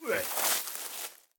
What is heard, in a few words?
Entry Snd; eldorado